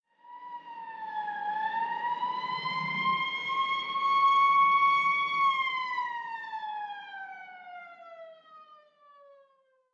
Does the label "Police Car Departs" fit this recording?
Yes